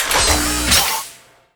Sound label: Clean Mech Large Attack Underlay